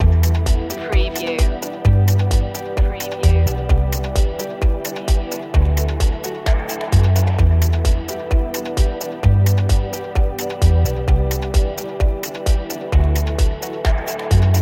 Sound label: airscape loop, ethereal